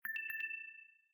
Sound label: Low Battery; LG; Optimus-Vu-F100S-NewFW